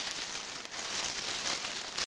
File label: rustle